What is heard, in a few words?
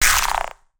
Sci-fi Energy Gun Blast